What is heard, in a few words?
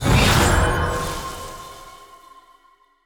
Enemy Boss Dark Binding Cast; bloom minigame